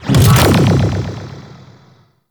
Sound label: Mortar Mine Trap Activate; Warframe; Venus; Venus Terra Mortar Moa; Abilities